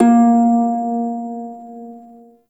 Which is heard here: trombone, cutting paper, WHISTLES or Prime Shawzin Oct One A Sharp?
Prime Shawzin Oct One A Sharp